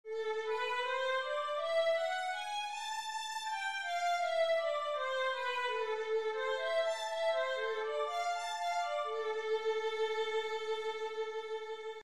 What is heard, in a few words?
violin a minor, scales 1 octave